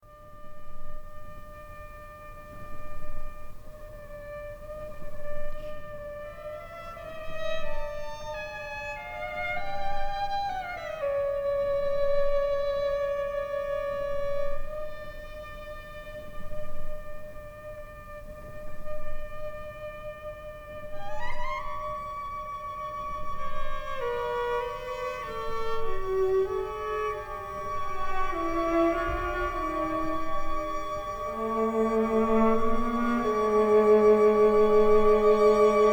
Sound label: string quartet